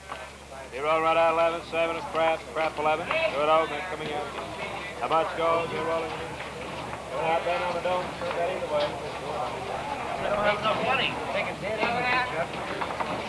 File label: casino; parks